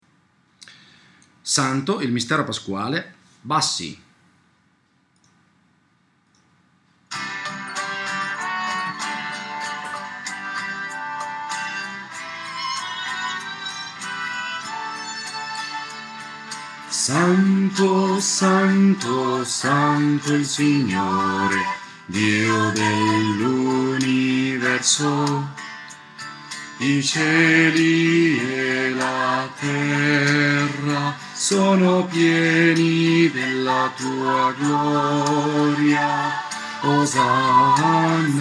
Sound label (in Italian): bassi; SANTO